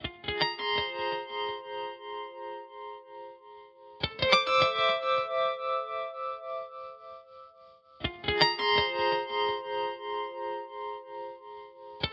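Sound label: waiting sound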